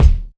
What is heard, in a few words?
perfect kick drum sample d key; Duration 0:01